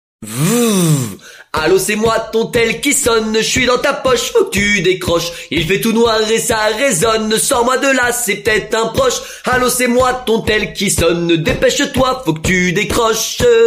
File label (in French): Sonnerie Telephone